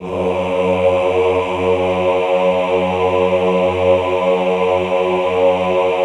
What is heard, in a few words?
AHH F#1, Classical Choir; Best Service; Extended Classical Choir; AHH LO+HI LL